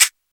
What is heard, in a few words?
dark tone foot cymbal D key; hits one shots; hat samples; 90s